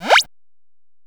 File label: jump